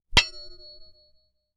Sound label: Impact Metal Strike And Ring Short; Impacts